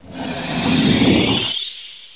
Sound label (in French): eclaire; Emme; Arc En Ciel Et Le Lagon Merveilleux; French Version